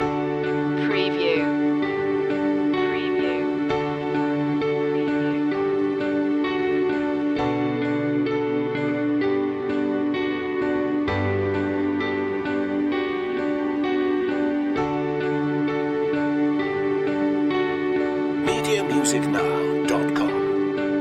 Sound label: drifting loop